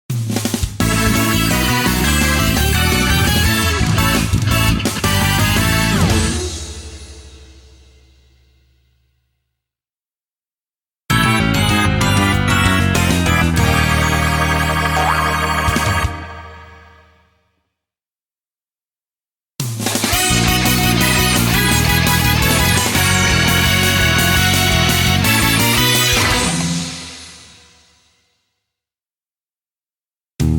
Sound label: Jingle Collection; music gungun best hit tune